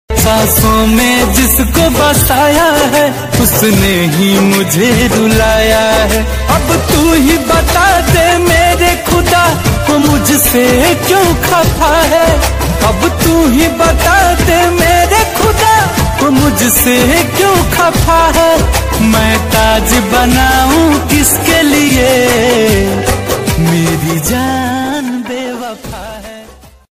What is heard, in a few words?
Sad Song Ringtone